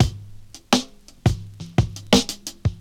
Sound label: stutter stunter, drum loops, funky